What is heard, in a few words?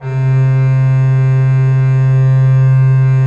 HGRP C2, Cathedral Organ, HOLZGEDACKT; Propeller Island